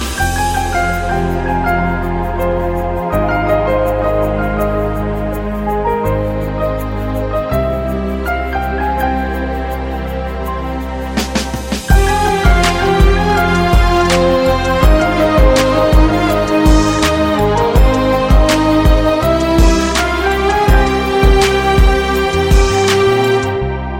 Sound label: instrumental ringtone; hacked movie